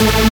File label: SYN TECHCH, Dance Synth; Sound & Vision - Gigapack I CD 2